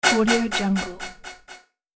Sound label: hit delay echo slow